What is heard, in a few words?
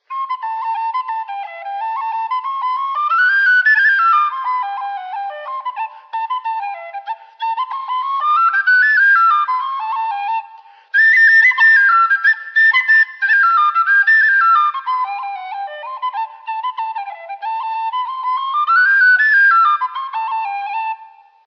Freeman Tweaked D Generation Hornpipe, whistles